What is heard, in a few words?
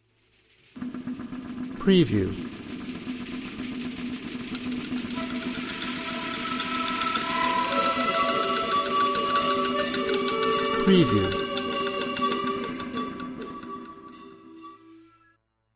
Aboriginal Jungle